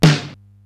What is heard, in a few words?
SDS9 SN, Simmons SDS-9, Snares